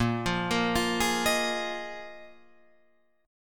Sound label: Bb 7th Suspended 4th Bb Guitar Open F; arpeggiated